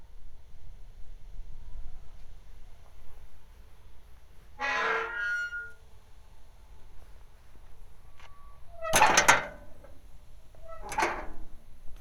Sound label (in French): passage niveau, Tonnay-Charente